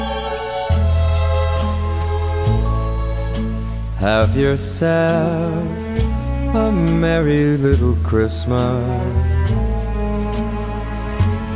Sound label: vocal